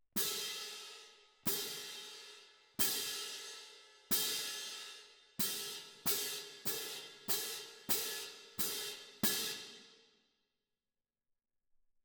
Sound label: Perc